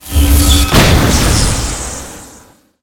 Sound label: flooding; magic bullet